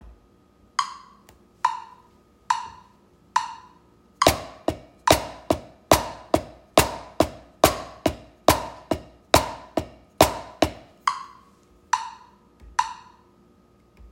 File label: single hand, drums